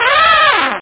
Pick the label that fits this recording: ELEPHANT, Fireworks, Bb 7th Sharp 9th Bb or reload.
ELEPHANT